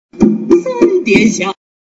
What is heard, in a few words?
sound water compare point